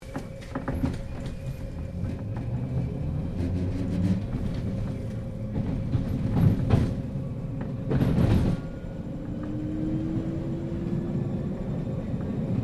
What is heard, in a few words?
Tram Melbourne W Class Interior Start Stop Rattling; Melbourne Trams; Streetcars; Vehicles